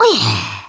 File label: OOFF, Polish; 1997 MMX LAN PACK (win 95); Worms Armageddon